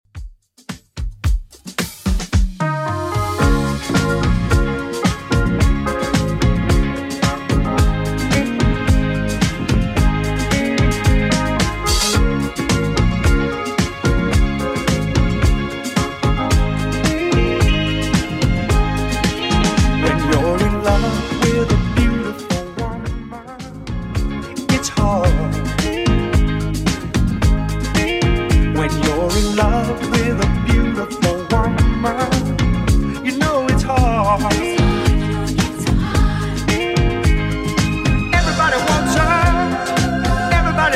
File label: 70s 110bpm; Redrum